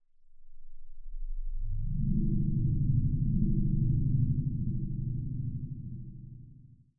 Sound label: Metal Swell G-1, Dark Swelling Synth